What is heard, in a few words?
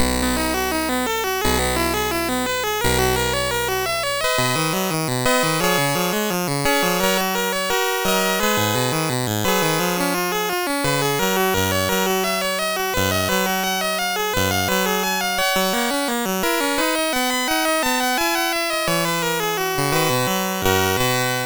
Preludium, sid MOS6581R2; Music Shop